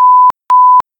beep twice; Camera; android 5.1.0

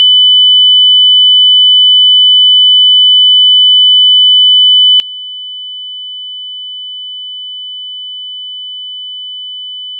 loud soft; itunes; android 9.0.0

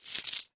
sell buy item; libgdx; gdx tests android

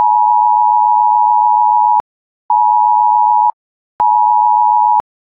attention signal; CellBroadcastReceiver; android 5.1.0

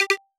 Camera Focus; android 4.4.4; effects

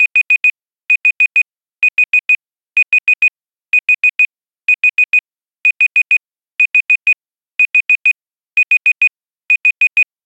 Timer Expire; DeskClock; android 5.0.1

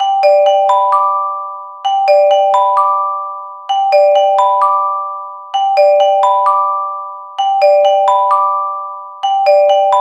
etws other disaster; CellBroadcastReceiver; android 10.0.0

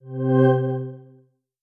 startup; chromeos; android 4.4w

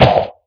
sound stomp; replicaisland; android 5.1.0

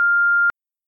record; Telecomm; android 9.0.0

